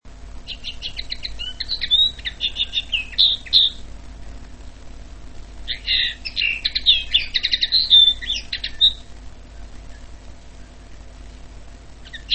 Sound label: carduelis cannabina; makolągwa - śpiew